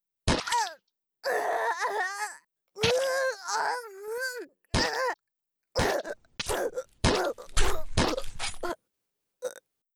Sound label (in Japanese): 脇腹を刺す